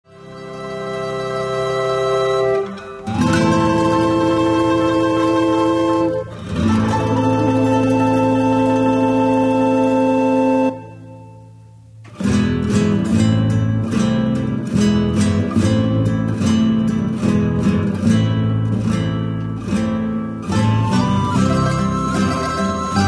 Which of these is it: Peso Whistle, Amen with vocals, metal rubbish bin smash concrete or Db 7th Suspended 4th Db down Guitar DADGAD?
Peso Whistle